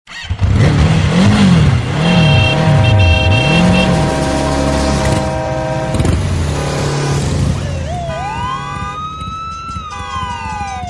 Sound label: vehicles sound effects